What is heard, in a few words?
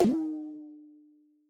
Springy Jump